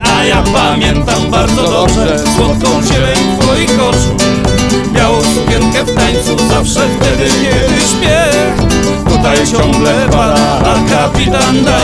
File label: unmixed; mix-mastering